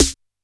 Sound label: D2 Analog Snare, Yamaha PSR-E323; Analog Kit